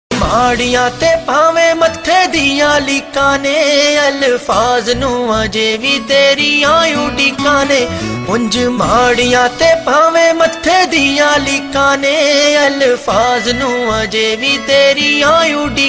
(Punjabi), Bollywood Punjabi Pop

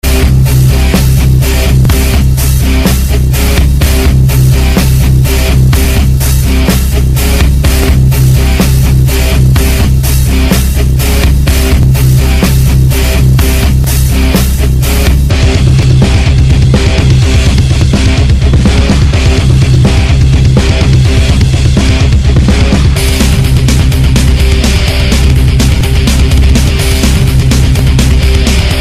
Hard Metal

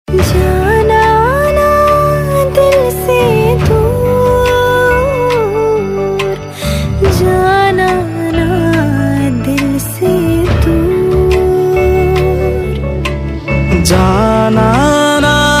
Title Tune Sad Tune; Jaana Na Dil Se Door

